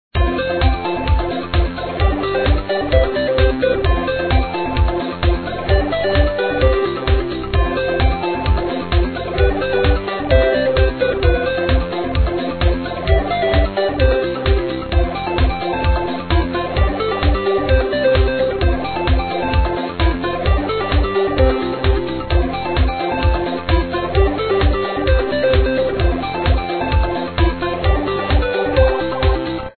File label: Menu; Pac-Xon